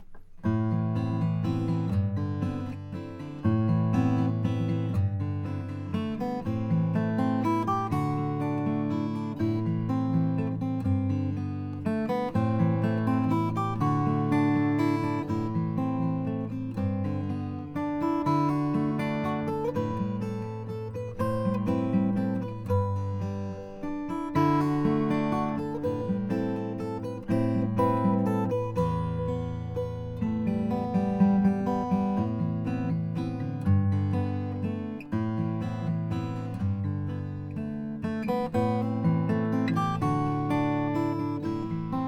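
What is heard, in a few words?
martin custom shop 0000 sinker mahogany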